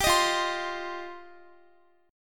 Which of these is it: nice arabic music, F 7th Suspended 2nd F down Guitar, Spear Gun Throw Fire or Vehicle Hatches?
F 7th Suspended 2nd F down Guitar